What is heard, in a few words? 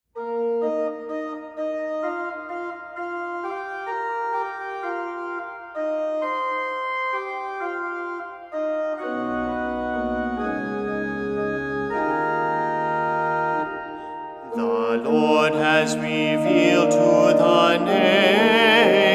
Tone